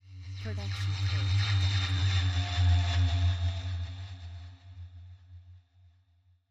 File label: scifi hum